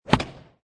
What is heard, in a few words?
Sports Golf Club Swing Impact Ball Indoor; Sound Effects:Sports